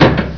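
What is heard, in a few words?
crowbar hit body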